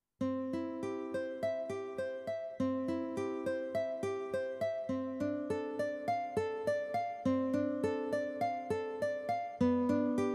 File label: Nylon Guitar